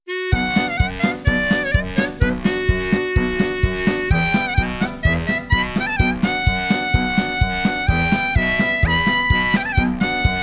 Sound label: Klezmerizing